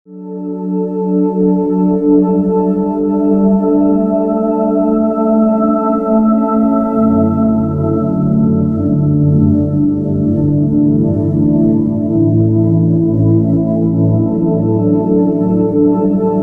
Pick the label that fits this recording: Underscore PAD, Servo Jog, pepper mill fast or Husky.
Underscore PAD